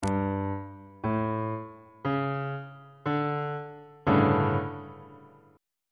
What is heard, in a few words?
c double sharp major 7th suspended 4th 1st inversion on bass clef